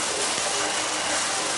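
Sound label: plasma grenade loop, plasma projectile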